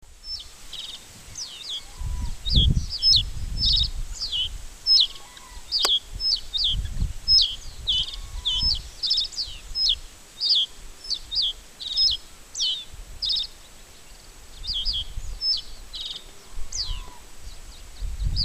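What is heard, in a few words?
song 2 males, background G grus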